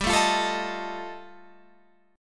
G 7th Suspended 2nd Sharp 5th G, Guitar Open F; G7sus2#5 strummed

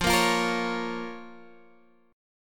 F Suspended 4th Sharp 5th, down, Guitar, Open G

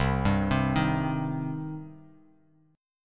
C Minor C; arpeggiated; JGuitar

